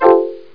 login

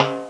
pan; My Science Fair

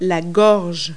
THROAT; Multi-Lingual Talking Picture Dictionary

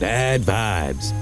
Vibes; anime sounds; hippie

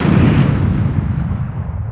Big boom

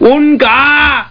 oongah

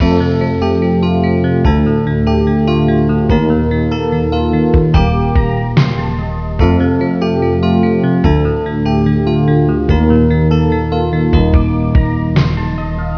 slide bgm

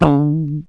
shrt thud, cartoon; Wired for Sound Pro CD; Aristosoft, Inc.

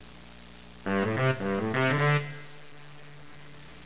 SAX; Broderbund Print Shop Multimedia Organizer Deluxe Edition

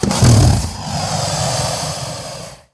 crocodile idle; DAoC

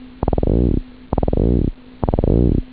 303und

